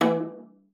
E3, VIOLA FINGER; Xsample VOL-5

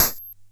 Bent Hat; glitch; Bent Hits

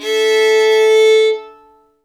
A3 fifth, fiddle; InVision Interactive; Lightware VOL-1; Instruments & Percussions

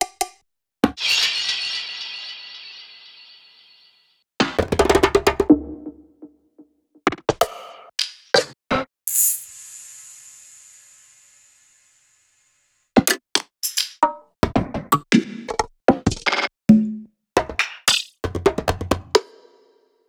Two Shots Perc

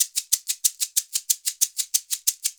SHKR LEGG; ILIO; Synclavier Percussion 2; World & Orchestral

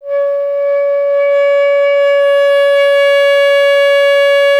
B. Clarinet

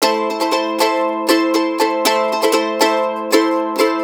Blues Guitar Samples, RIFF; ILIO; Fretworks; CEE RIFF

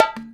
BONGO; InVision Interactive; Percussion Slam; ZOMBIE

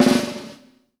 BUZZ VERB, PROC SNRS, Drumworks; Sampleheads; New York City Drumworks VOL-1

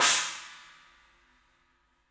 CYMBALS; 3D Sound; 3D Drums

